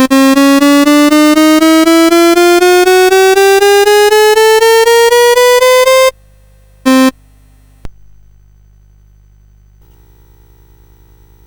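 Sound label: Quarter Tone Demo, MOS CSG8580R5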